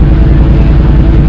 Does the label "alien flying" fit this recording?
Yes